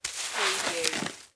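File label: Sand Pail Scoop Small; sound effects bible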